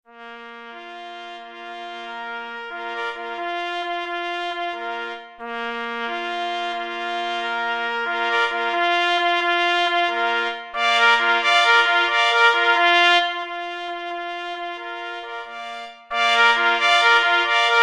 na 3 plesy; W droge mysliwi glos trabki plynie

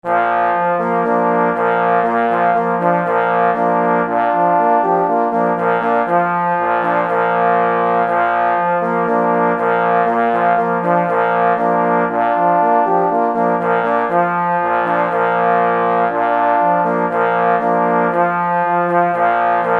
Fanfara Psiarzy Galicji na 3 parforsy; B. Kopera; pies w muzyce lowieckiej

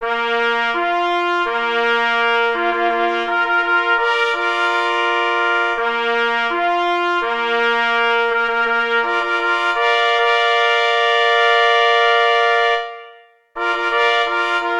Fanfara na czesc psow na 3 plesy; pies w muzyce lowieckiej

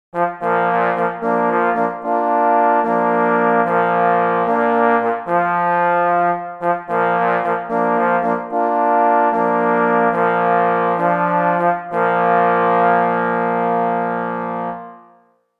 Hejnal, na 3 parforsy; Hejnal Kola Lowieckiego GLUSZEC w Kartuzach

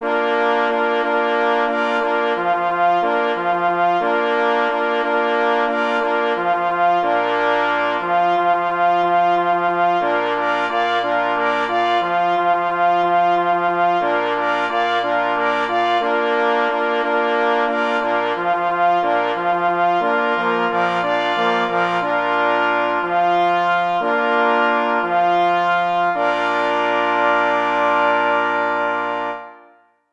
na 2 plesy i 2 parforsy; F. Nowowiejski; Wyjazd na polowanie

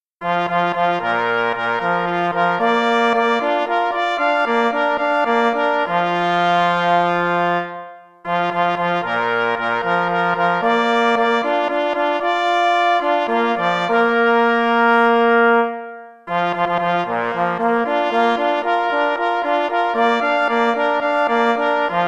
na ples i parfors; Cz. Trybus; Na powitanie gosci

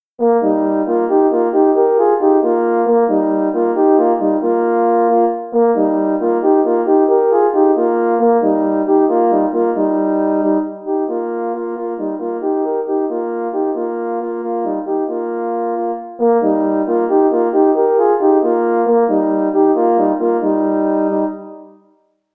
Fanfara 2 parforsy in Es; I. Muzny; Fanfara dla mysliwskich psow; pies w muzyce lowieckiej dodatek

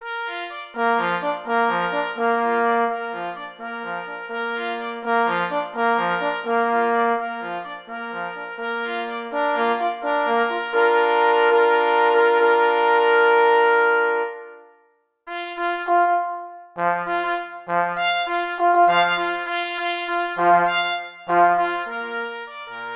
2 plesy i 2 parforsy; Polowanie na przelatki

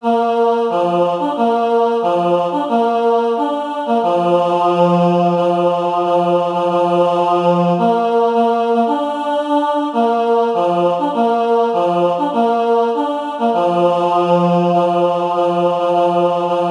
Wiwat spiew prymka

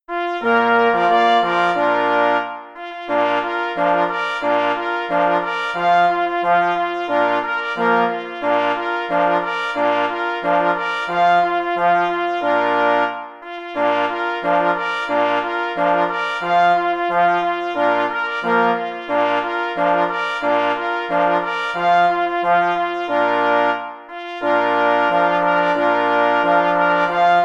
Marsz na 2 plesy i 2 parforsy; J. Rosner

